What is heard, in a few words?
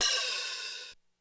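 Hi Hat Open, Korg DSS-1 Drums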